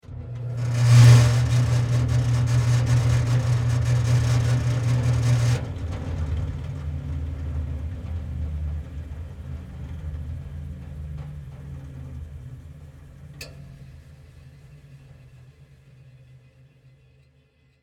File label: Table Sander Run, Industry, Table, Sander, On, Run, Off, Short; Sanders; BlastWave